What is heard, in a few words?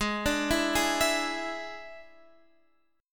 D Suspended 2nd Flat 5th Ab, chord